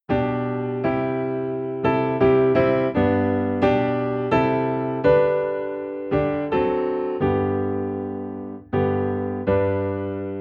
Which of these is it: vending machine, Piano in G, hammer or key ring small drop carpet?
Piano in G